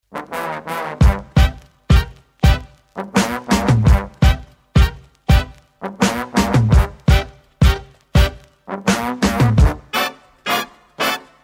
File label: Short Swing